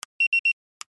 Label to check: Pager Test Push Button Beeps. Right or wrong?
Right